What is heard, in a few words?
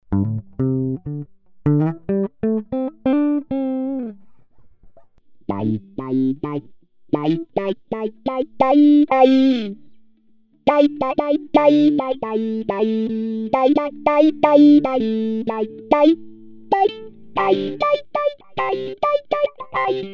BOSS Dynamic Wah AW 3